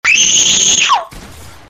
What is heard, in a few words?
screaming rage